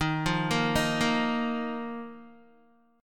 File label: D sharp Suspended 2nd, Guitar; arpeggiated; JGuitar